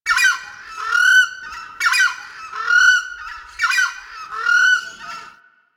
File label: Forest Bird Group; Warframe; Ambience; Grineer Forest